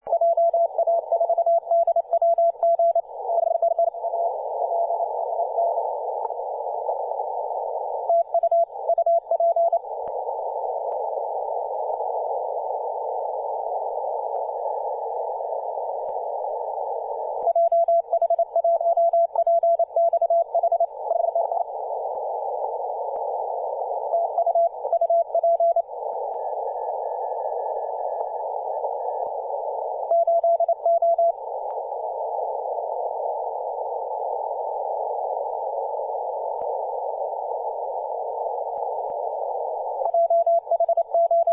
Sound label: 114 MHz CW